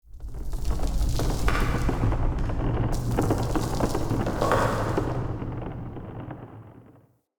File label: Cave Mine Rumble